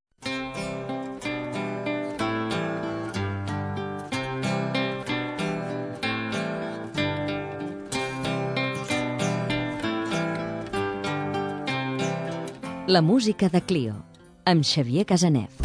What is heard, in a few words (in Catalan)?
campanes flabiols i tambors